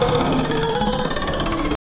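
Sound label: online slot machine reel, casino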